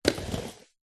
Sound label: rollerblade single roll step; foley